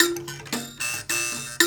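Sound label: PLK Bass Mbira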